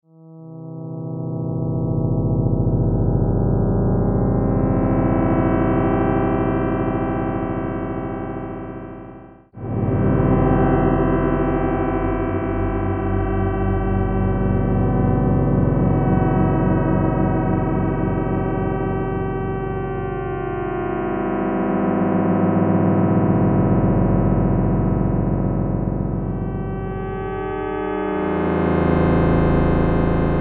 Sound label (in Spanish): armonicos; IUS CHASMA